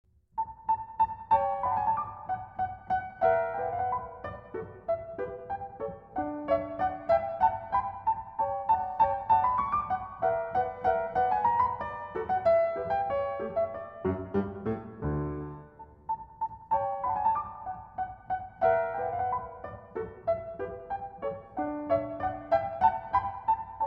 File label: Variations on a Minuet; by Duport; K. 573